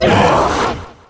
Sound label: skrek thing, bugs; starship trooper; Nejlepší České Hry